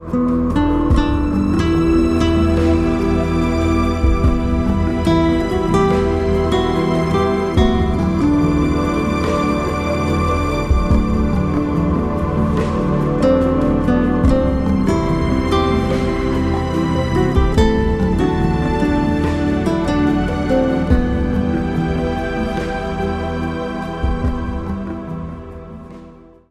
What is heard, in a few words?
indian summer breeze